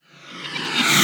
reverse, FX; Vengeance Samples

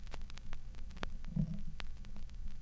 Record End, Vinyl Record; Record Noises

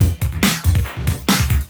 Loops BB 140, BreakBeats; Vengeance Samples

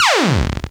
FX Scratching; Vengeance Samples

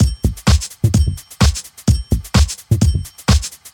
House Beat, 128 BPM

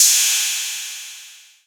Ride, Cymbals; Vengeance Samples; VEH2

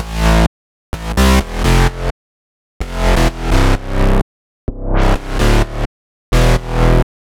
Query Bass Root A, 128BPM; Vengeance Samples; Query Kit

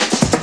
Fill 140 BPM; Vengeance Samples; VEC3 Loops 140 BPM

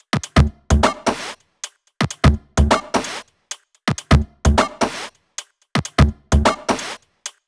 128BPM Tribal Kiss Kit

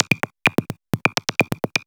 VFX2 Loop 128 BPM

